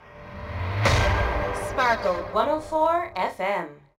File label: Radio 80s Jingle; Gangstar Miami Vindication; Android; macOS